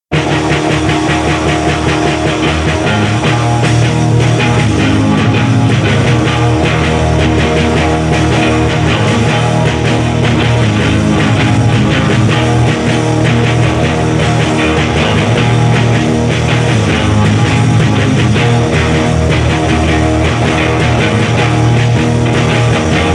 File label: Thunderin guitar